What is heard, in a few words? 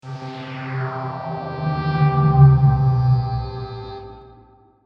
Synthetic Swell